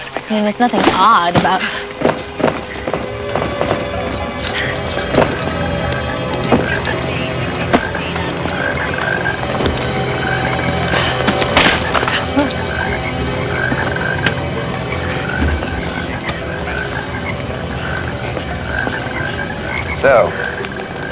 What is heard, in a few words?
toads